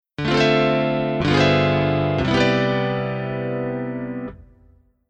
Keyboard EP Soundmake Preset

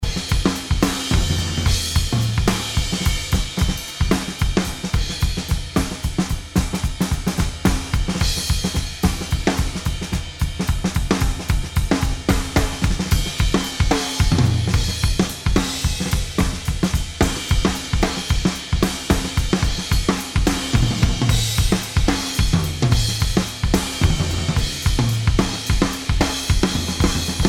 Drums Bus Plate Rev On

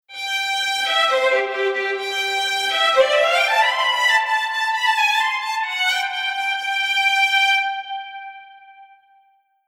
Strings Layer Track

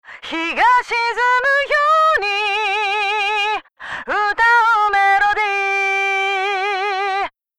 distortion overdrive

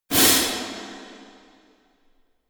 cym choke

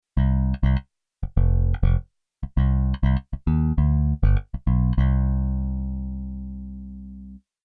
Bass Reggae Bass

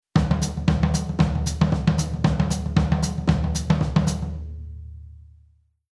Rhythm Jungle Beat

